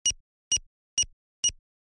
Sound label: binary keyboard